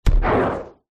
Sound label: medieval fire arrow fire